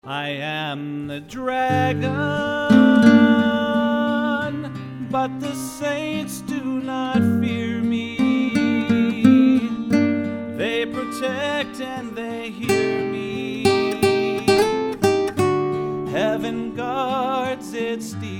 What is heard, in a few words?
Background Guitars